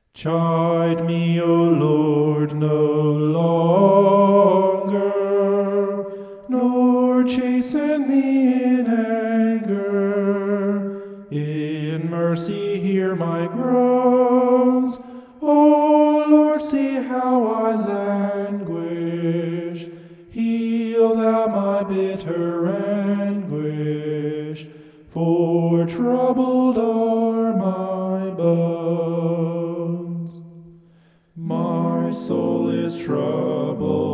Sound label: vocal homoph; psalm 6